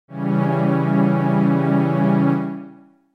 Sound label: Db Db Diminished